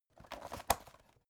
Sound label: folder open hard